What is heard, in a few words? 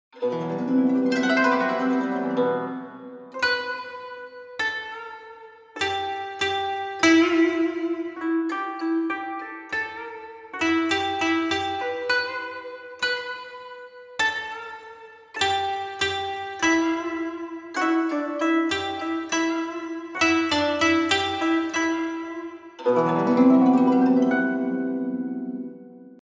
koto sample; issunboshi